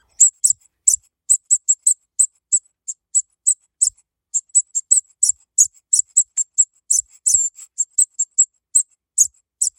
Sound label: Mouse